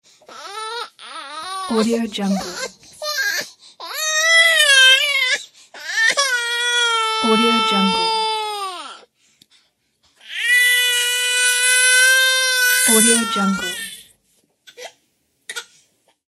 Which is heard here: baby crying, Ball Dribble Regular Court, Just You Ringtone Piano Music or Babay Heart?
baby crying